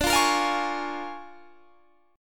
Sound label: Bb 7th Sharp 9th D